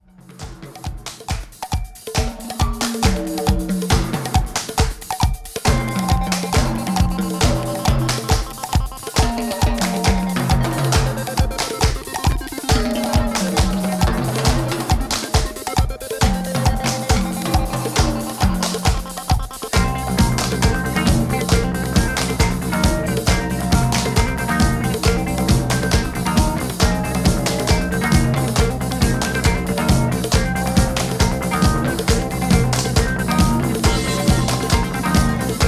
drums

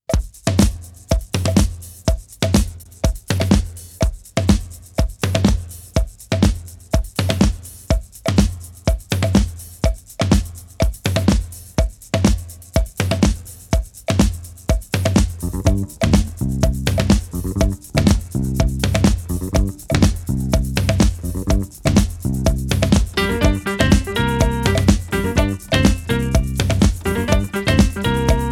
Drums Music Show Strumentale

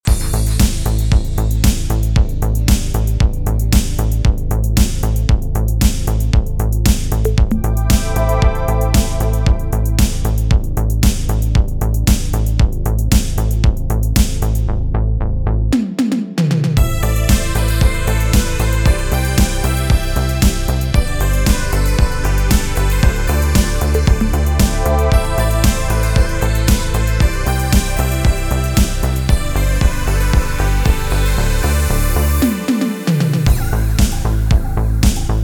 Instrumental

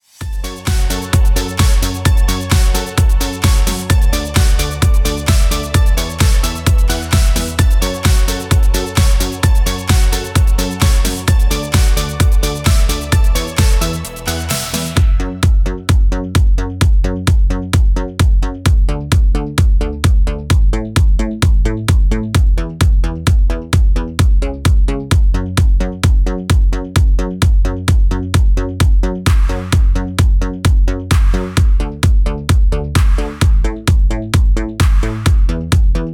Club Instrumental Mix

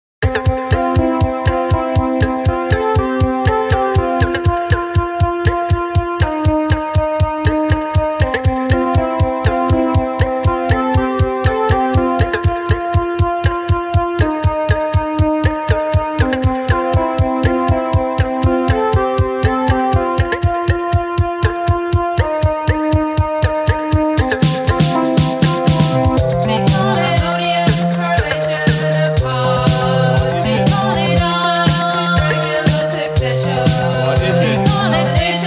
Dance Music Rhythm

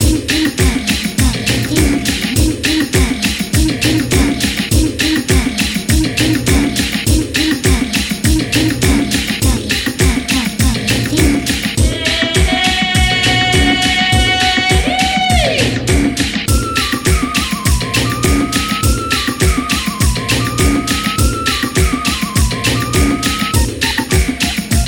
Tambura Tabla Mix

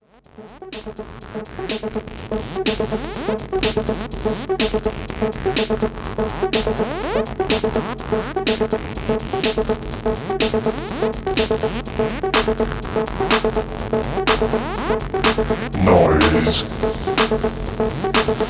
Noise

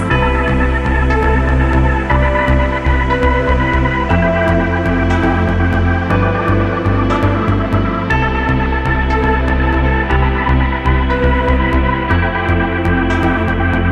heartbeat drive

